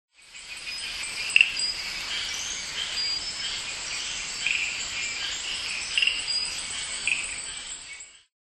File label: Spinomantis massi